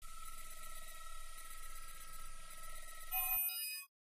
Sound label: data download; Secret Agent